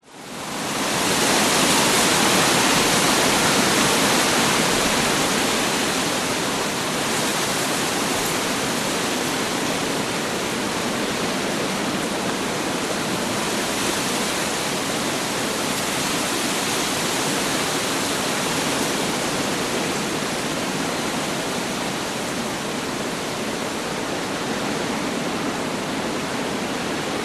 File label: wind strong tall trees storm; nature